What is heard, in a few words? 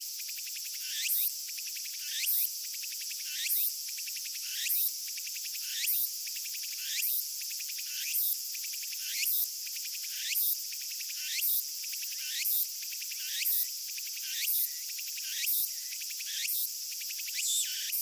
tsukutsukuboushi cry, animal